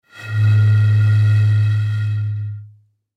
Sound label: Bottle Blow; Foley Wine Bottle Blow Musical Wind; Bottle Sound Effects